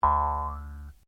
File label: JAW HARP SHORT, simple cheap jaw harp; Miscellaneous Musical (Sound Effects:Musical)